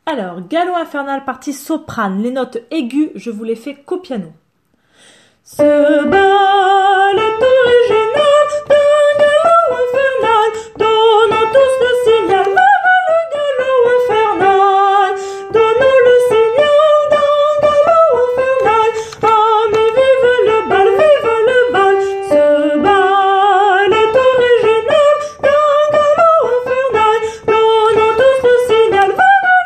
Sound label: soprano; Galop infernal